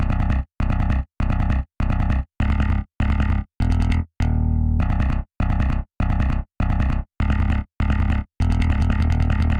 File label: Drums and Jingles Evil Bass E